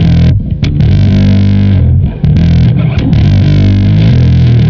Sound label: Bass, Distorted; Bass X